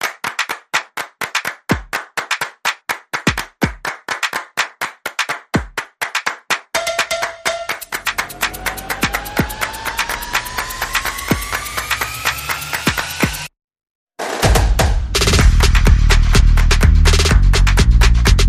Very Bari, No Saxophone

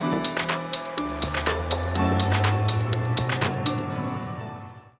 Link Sting

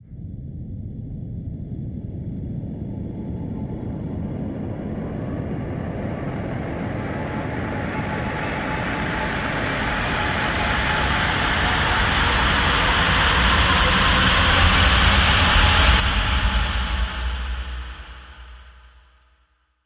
Receiver Rise

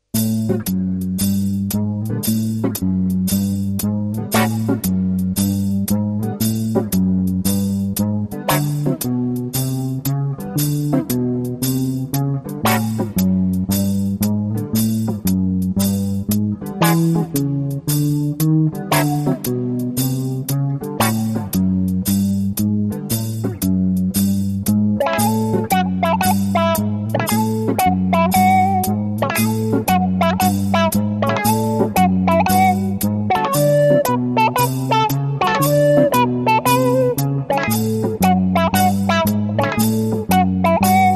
Sweep Blues

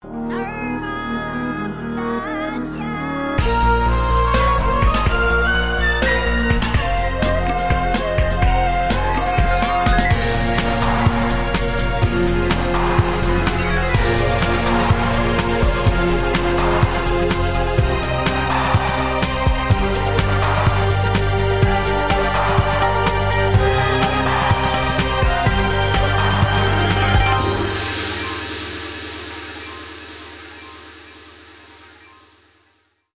Arabic Theme

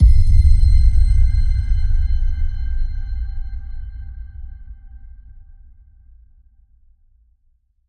Pin Drop Soft Boom

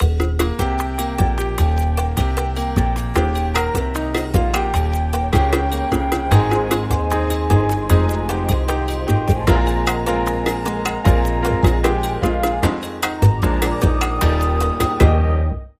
Jingle Bells

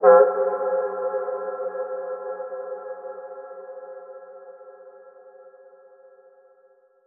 Echoes Cyber Ping

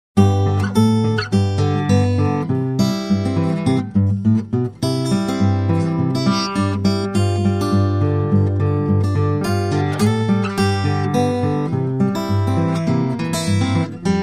Texas Finger Pickin

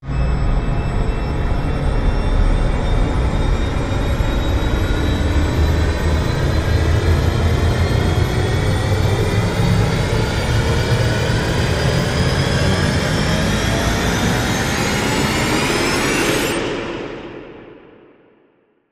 Rise Long Aircraft

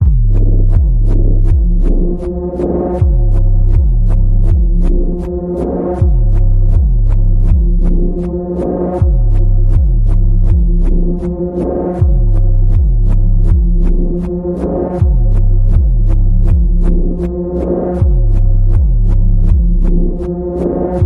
Panning Pulse F, Key of F

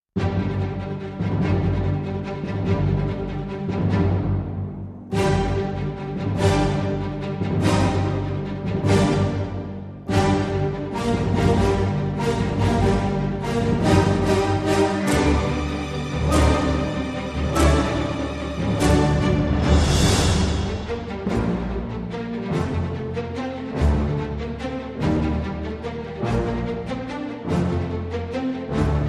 Light Perc

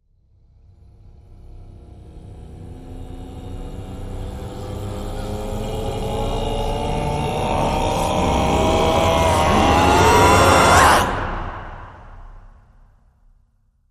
Vocal Fury Viking Rise

